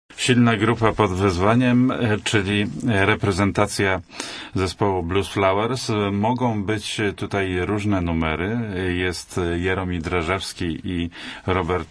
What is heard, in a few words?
blues flow